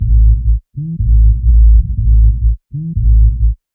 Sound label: Bass Groove 122 Bpm A